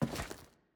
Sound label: Footstep Glass